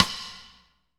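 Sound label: SD, snare drum, Real-Kit; Sound & Vision - Gigapack I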